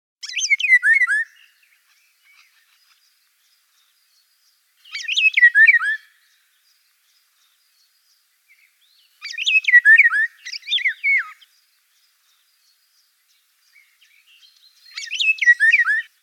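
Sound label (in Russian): obyknovennaya ivolga golos, golden oriole voice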